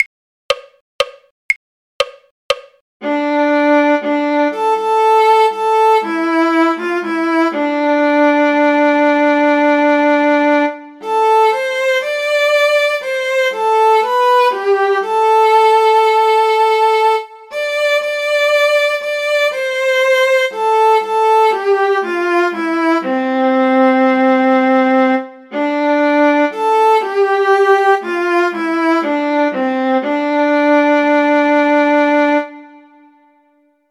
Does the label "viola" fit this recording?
Yes